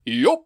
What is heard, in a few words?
yelp